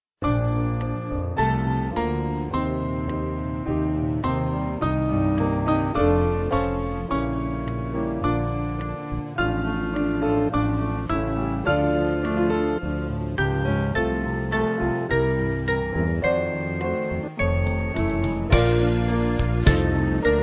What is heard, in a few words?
Band; When He Shall Come; Pearce